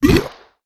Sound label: Monster Belch